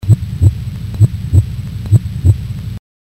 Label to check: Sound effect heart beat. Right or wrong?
Right